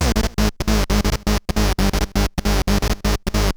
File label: Kaossilator gated (130BPM); Korg Kaossilator; creative gate; musicradar